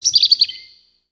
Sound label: Bird